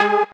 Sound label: Mainlead Melody